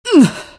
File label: pain, female; video games; npc; source engine; episodic; episode 1